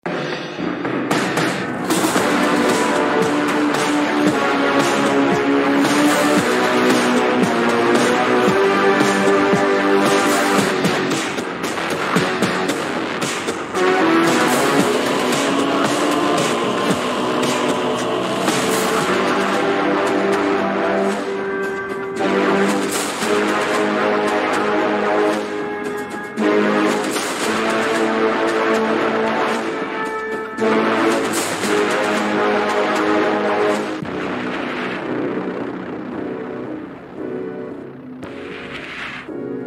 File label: Intermission Music; Warhammer 40,000: Boltgun